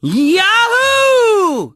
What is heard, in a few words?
yahooo